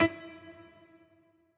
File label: piano riff intro